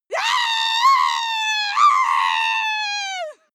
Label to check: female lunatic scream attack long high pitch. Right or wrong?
Right